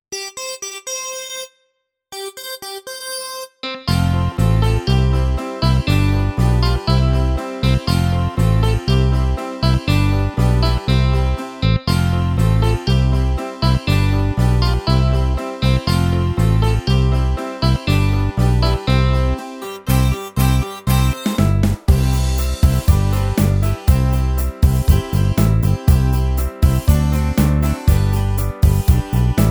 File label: Karaoke